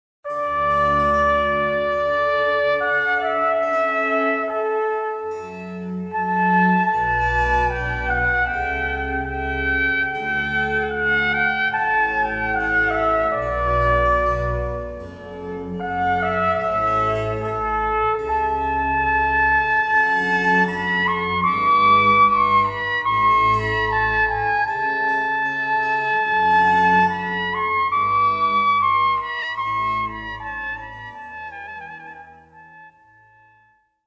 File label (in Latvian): koncerts trompetei; g.f.telemans